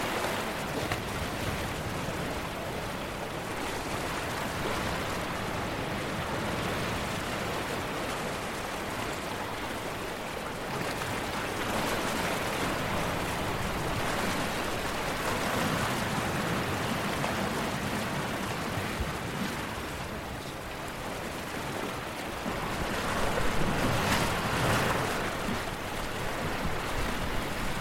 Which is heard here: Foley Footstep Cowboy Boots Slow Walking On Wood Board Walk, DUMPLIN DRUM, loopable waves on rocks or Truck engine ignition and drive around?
loopable waves on rocks